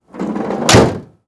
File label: HOOD CLOSE, Auto; trasporti